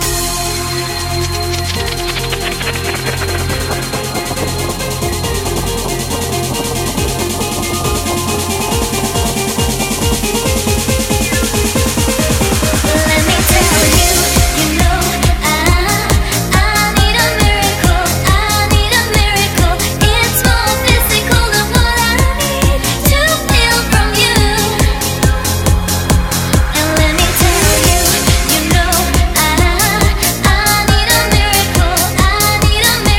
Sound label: Snippet 138bpm, Fm